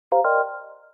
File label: Fold open, Nokia N76